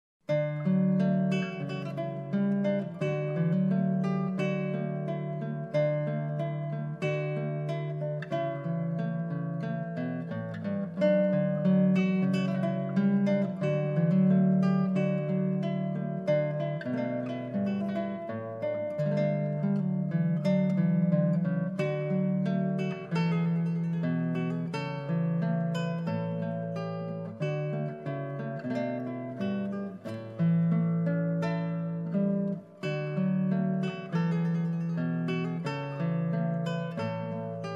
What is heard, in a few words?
Trumpet; Clark, J. - Trumpet Voluntary; (formerly attr: Purcell)